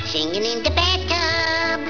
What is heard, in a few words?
bath